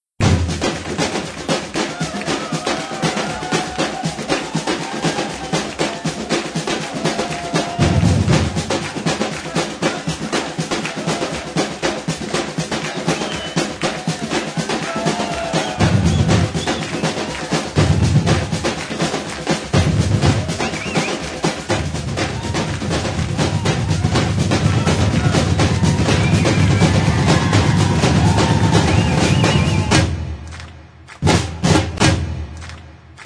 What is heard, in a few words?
Reggae